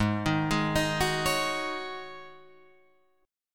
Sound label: G sharp 7th Suspended 4th G sharp, Guitar Drop D